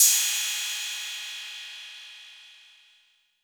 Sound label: Roland 808 CY